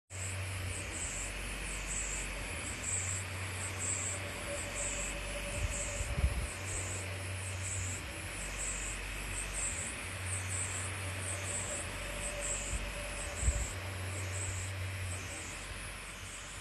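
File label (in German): Laufgeräusch, Original Prusa i3 MK3; x achse leichtes stocken layer lines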